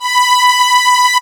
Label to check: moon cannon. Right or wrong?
Wrong